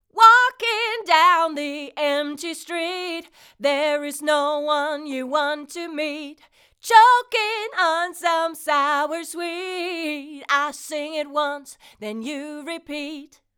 TritonAudio D2O Mono Vocal Fet LO

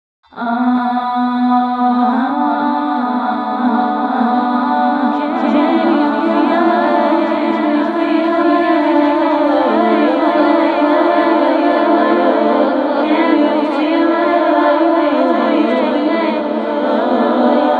Vocals Swarm mit Effekten; Dawesome LOVE 1.0.1

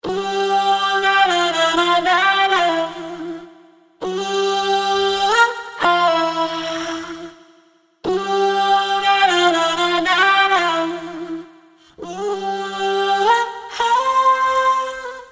Dark Echoes

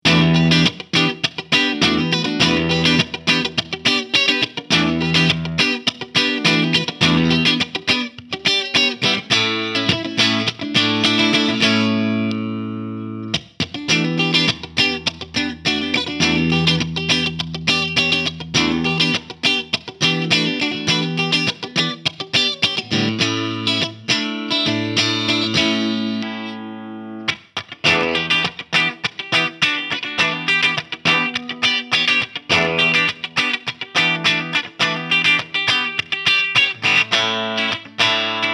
Chapman Guitars ML2 Pro Clean; Azure Blue

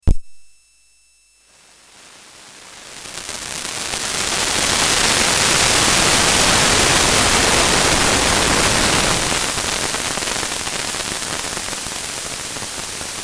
Metasonix D 2000 Gated Noise Output

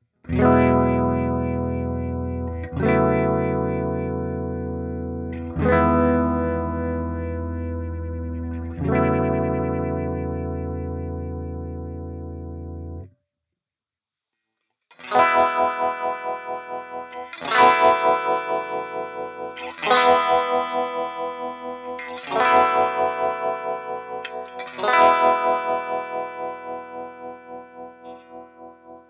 filter

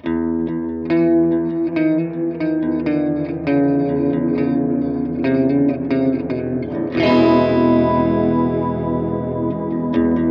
Preset Green

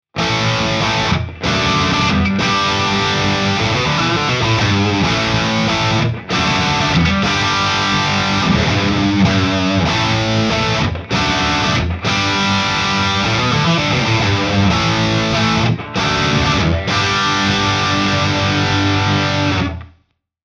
Fire Breather 50 Room; Guitar Rig 6

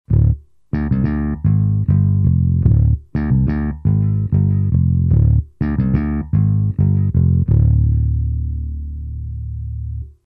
Bass vintage; Universal Audio Volt 2

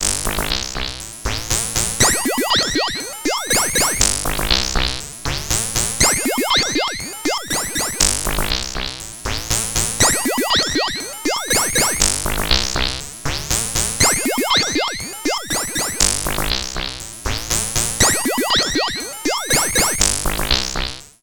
Dreadbox Drips V2

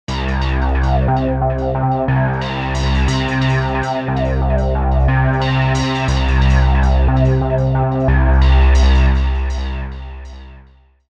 Bass

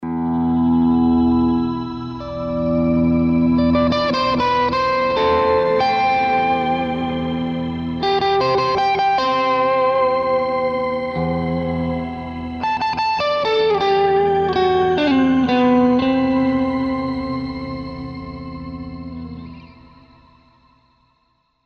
Ibanez AZ226 Ambient

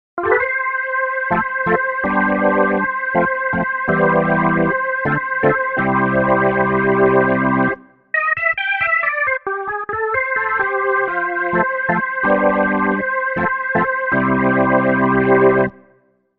Organ; Vivo S1

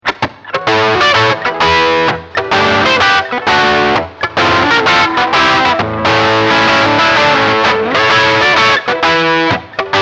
Crunch

